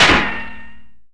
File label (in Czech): kulka; Mafie 1